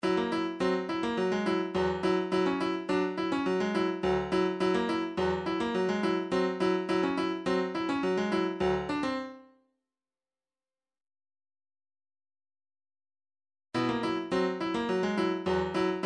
steady beat 105 bpm; godzilla

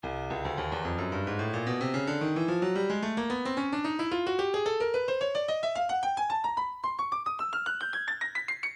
breakbeat 110 bpm; booganu a; Stylus Breakbeat Midi Files

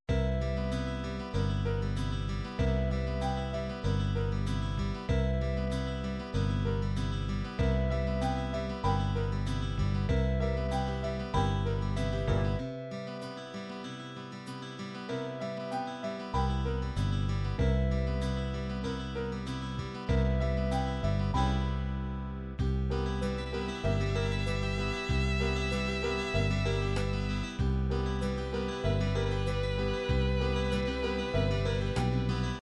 folk, midi

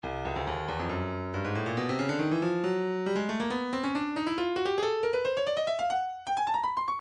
breakbeat 138 bpm; Stylus Breakbeat Midi Files 130 139 Bpm

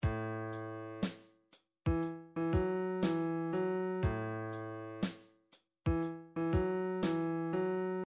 drums motown

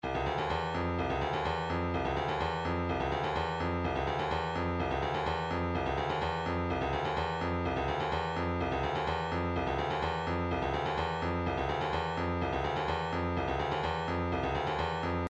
vocal planet go fills, midi

